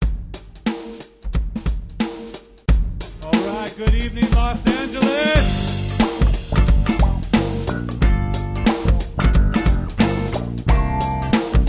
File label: funk; funk demo; mono